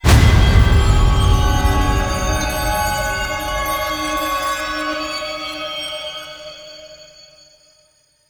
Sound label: Chimes; Quests; The New War; Throne Room; Ballas